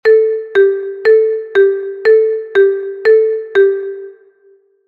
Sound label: marimba sound